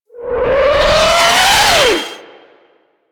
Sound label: Artillery Whizzby; Enemies; Abilities; Teralyst